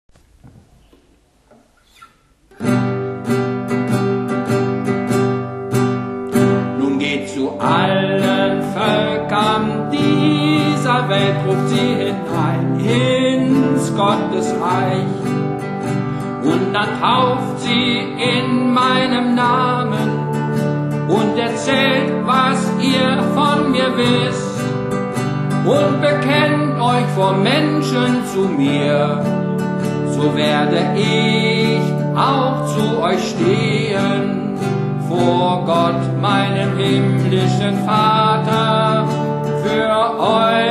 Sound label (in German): Nun geht zu allen Volkern, Orgel